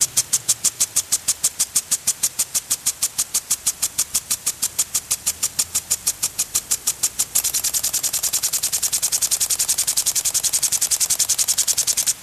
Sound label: Sprinkler Metal